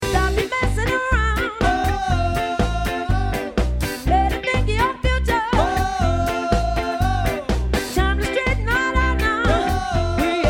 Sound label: swing beats